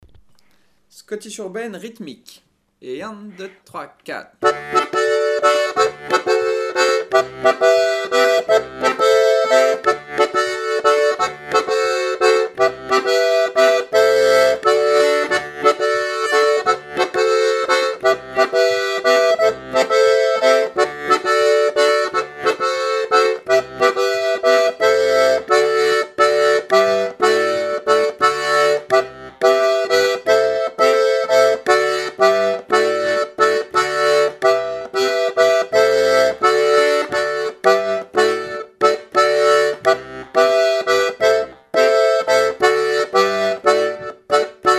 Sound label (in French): scottish urbaine rythmique; ateliers diato 2; L'atelier de la casserole